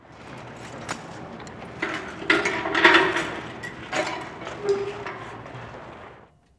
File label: Industrial Sliding Doors close